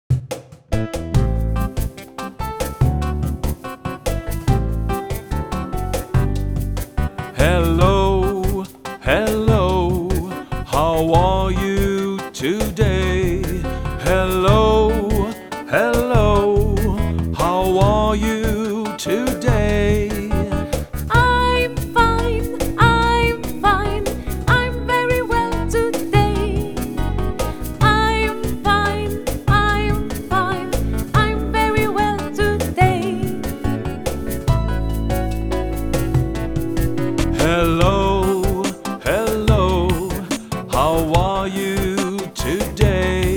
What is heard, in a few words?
hello how are you, comptines